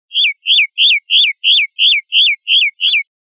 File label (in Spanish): rep golpes